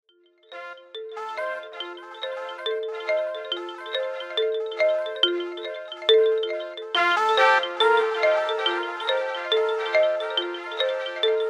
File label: 2 marimbas